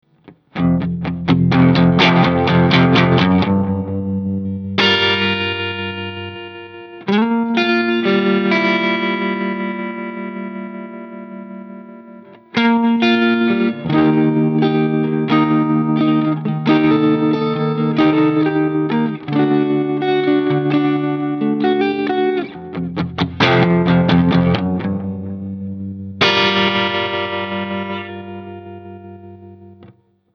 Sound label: TREMMATIC; RIFF-RAFF-TILAY